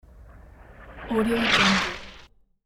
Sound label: mortar shell fly by; hollywoodedge